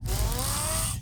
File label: war walk; NECROMETA; Mob